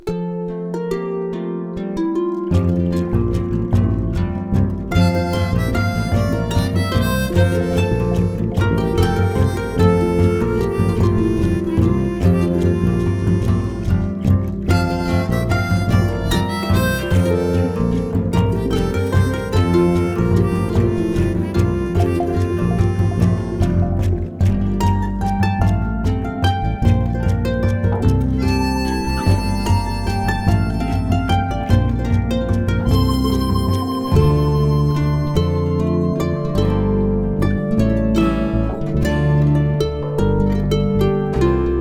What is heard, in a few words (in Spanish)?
final sin flauta